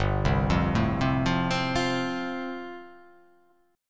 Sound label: G 7th Suspended 2nd Suspended 4th